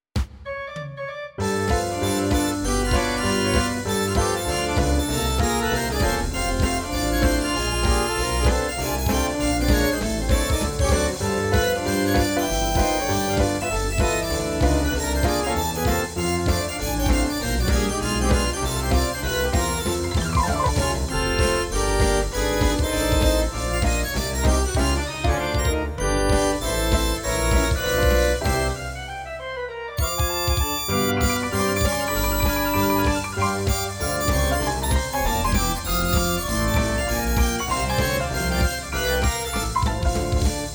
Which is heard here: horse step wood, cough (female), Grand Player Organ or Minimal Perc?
Grand Player Organ